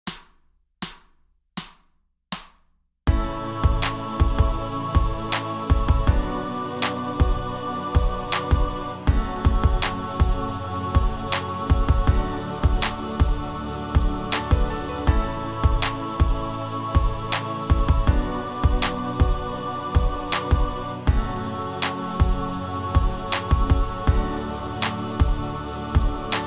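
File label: BPM 80 A B minor F minor D, jam along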